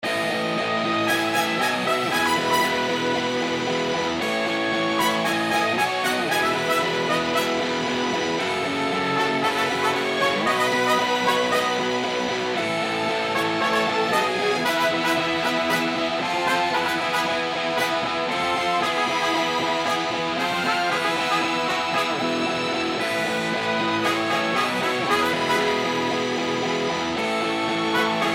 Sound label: bg super win track; Lucha Rumble